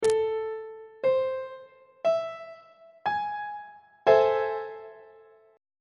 g double sharp minor major 7th chord on treble clef